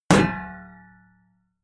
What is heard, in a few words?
shield metal impact ring